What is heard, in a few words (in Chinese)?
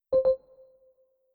录制结束音效